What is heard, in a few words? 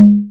Conga Low, linndrum; classic machines